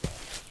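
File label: footstep grass; 0ad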